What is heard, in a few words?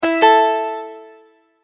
multimedia tone verified